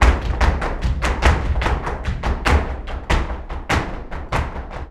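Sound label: percussion, ALEGRIAS TAP, Flamenco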